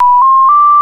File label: Enter to program, Signalling Tones Elevator Phone